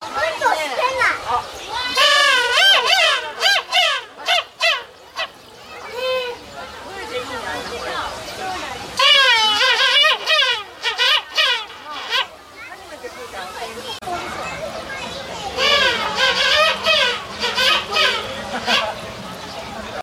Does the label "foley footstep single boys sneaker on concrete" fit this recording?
No